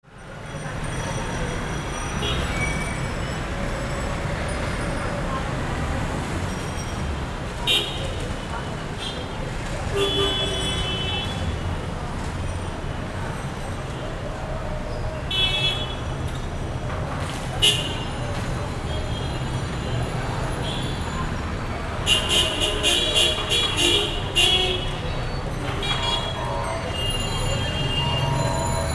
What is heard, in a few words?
Street signature, Bangladesh; Les Cartes Postales Sonores; NOISE ON EARTH Vol2